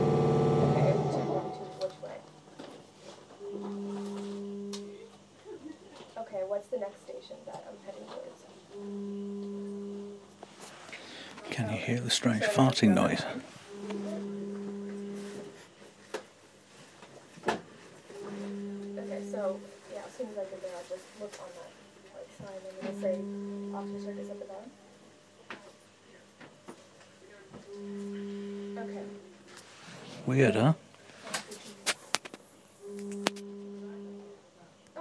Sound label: train farting